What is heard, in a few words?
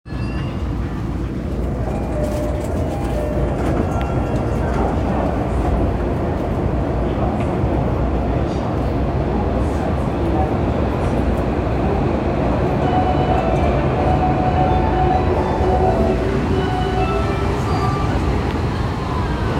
train sounds; 日比谷線秋葉原北